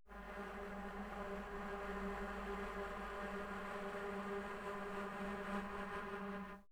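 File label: alto flute G4; dsp2008 sound library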